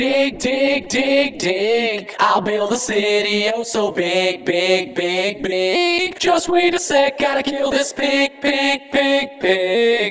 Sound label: Vocals